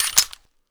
box in

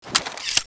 draw, weapons; cso; tornado

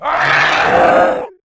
death

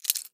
pick movement, lockpicking

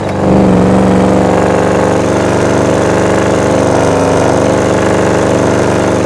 gauntlet gear; gta5

